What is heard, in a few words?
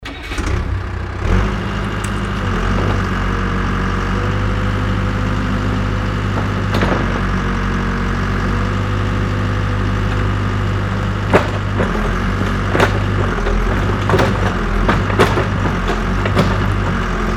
digger unloading rubble